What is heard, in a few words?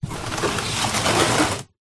cardboard box tall empty slide garage floor; foley